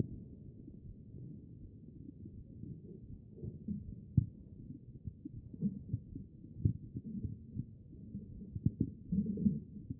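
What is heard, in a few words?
anterior chest, position 4; B MODE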